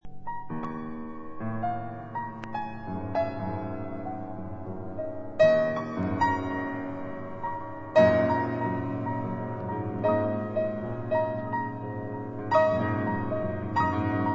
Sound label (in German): Klavier